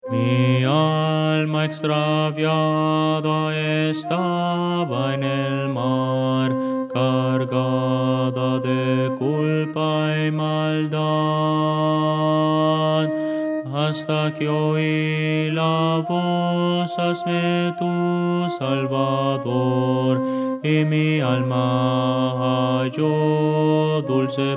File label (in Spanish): contralto, himno